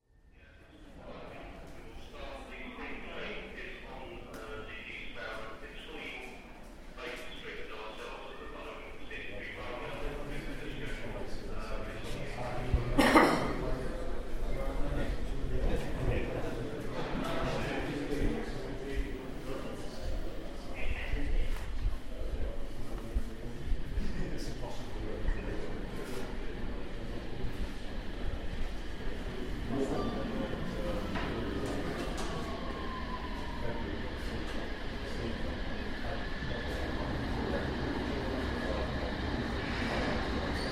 steam train passing through great portland street tube station stereo